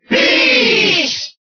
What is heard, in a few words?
Cheer Italian; Peach; SSBB